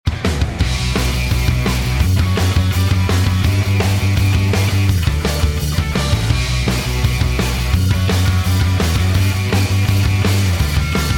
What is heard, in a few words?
Gritty Instrumental; PM USA